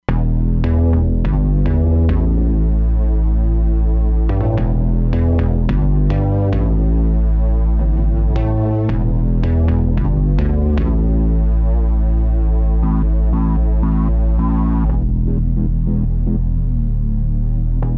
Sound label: Dust Bass, Roland Fantom XR